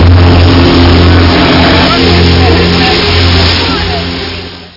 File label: Teleport; worms; arnie; amiga